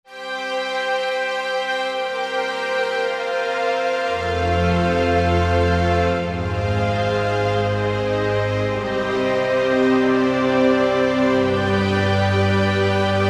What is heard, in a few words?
Octo Orch, Roland SRX-03 Studio SRX